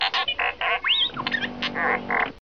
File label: Rare beeps